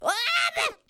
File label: dying noise; Mario